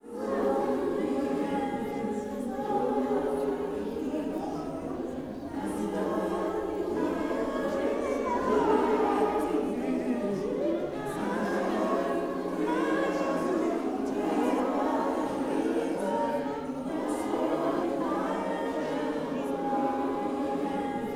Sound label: SPIRIT SONG, Gospel; Spectrasonics; Vocal Planet; SPIRIT SNG